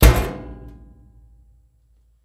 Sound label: Clothes Washer Slam